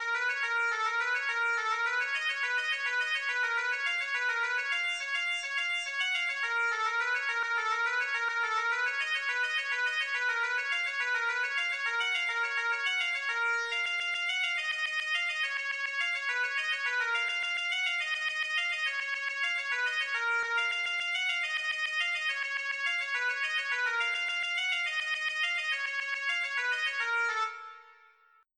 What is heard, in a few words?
Computing Loop